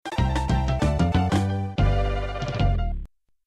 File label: Lose Life; donkey kong country 4 the kongs return demo 1 windows 2012